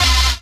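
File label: Rave Synth, Dance Mega Jungle Rave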